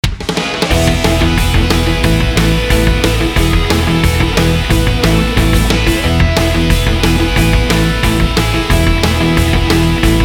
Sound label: teen star fast indie rock